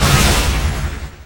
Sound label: cry heart